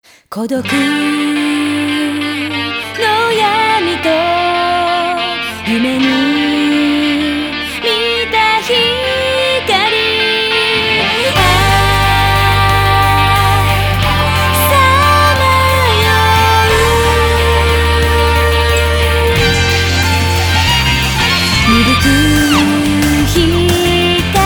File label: dissonant chord